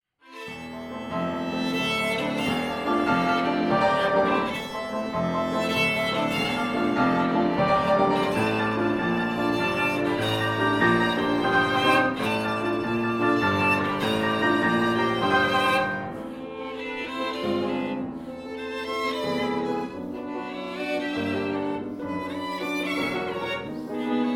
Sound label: Second violin and piano sonata 4th movement